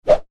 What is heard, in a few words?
cable whoosh air; Cable Swish / Whoosh As It Cuts Through Air - Good Karate Movement Or Transition Effect; Whips (Sound Effects:Sound Design); McKinney Sound